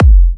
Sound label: F, kicks; markus hakala kicks